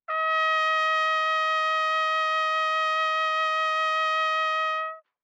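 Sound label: Trumpet vibrato ff Eb5 stereo, Bb Trumpet; MIS Pitches - 2014; Brass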